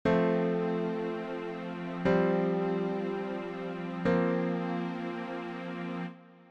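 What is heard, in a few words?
F Major Chord Sus Chords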